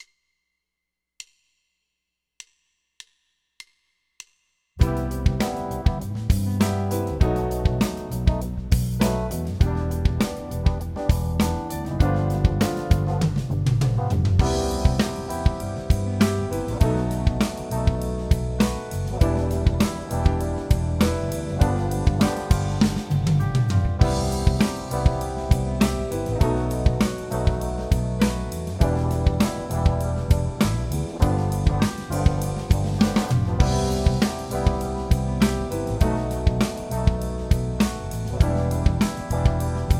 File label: Cmaj7 B7 Em7 G7; guitar